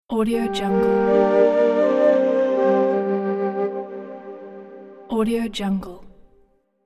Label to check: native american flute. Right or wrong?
Right